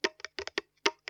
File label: SCI-FI